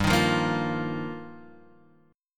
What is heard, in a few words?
G Minor 7th Sharp 5th; Gm7#5; strummed; JGuitar; x,x,x,0,3,0,0,2